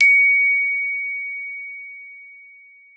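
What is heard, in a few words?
GLOF C#5, glockenspiel; Xsample VOL-4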